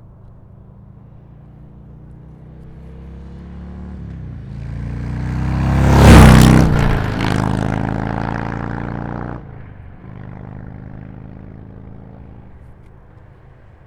Touring 107 4 Slip-On Fly By, slip-on mufflers for harley touring; rinehart-4-slip-on-mufflers-for-harley-touring